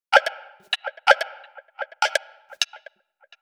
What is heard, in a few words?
perc 127 woodblock; drums pads